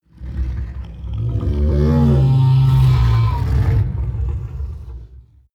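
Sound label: coloss groan deep long, creatures; kreaturen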